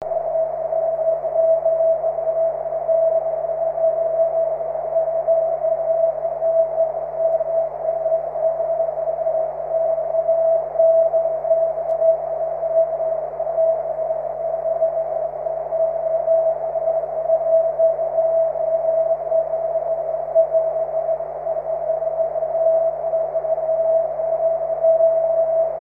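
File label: continuous wave, 23cm; k0all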